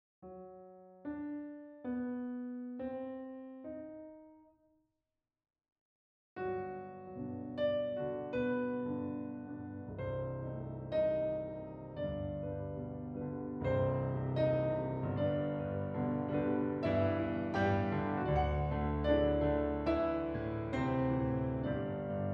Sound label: improvisation